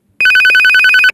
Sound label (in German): VT100 innenstation